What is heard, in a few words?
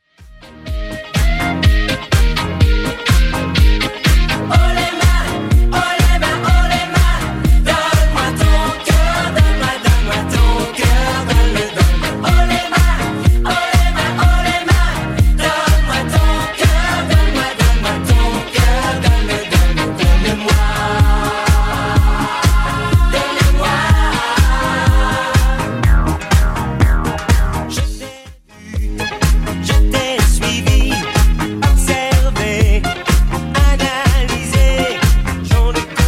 80s Redrum 124 bpm